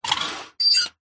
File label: industrial cart metal pull short screech